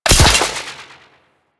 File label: Grapple Fire; Enemies; Warframe; Kela De Thaym